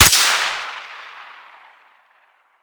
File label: silenced